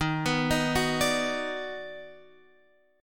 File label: D sharp Minor Major 7th Sharp 5th